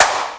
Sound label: smooth snare sound F sharp key